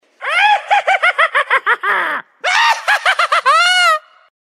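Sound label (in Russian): zlodey raduetsya, villain rejoices; Высмеивание и издёвка над людьми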